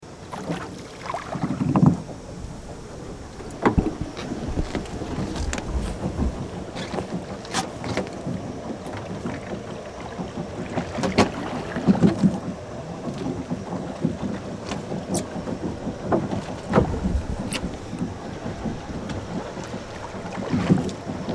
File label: boat river